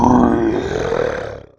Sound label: tro dead